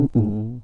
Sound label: game over